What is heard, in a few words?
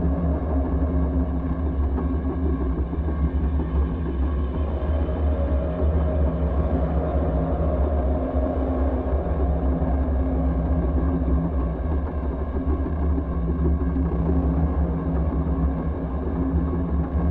ship slaver idle; pre-FTL; Stellaris; first contact